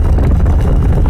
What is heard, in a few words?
Scrape Heavy Stone Loop; Doors